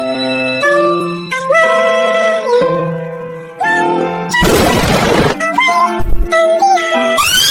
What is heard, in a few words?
pitched and sped up